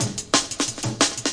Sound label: 180 rollin, drum loop; 161 to 180 bpm